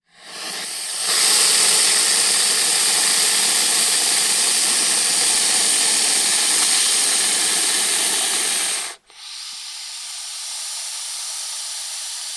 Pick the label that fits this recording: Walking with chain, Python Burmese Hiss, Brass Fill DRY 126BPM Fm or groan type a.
Python Burmese Hiss